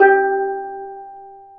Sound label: G3 TENOR, STEEL DRUMS; AKAI S6000 CD-ROM; WorldSounds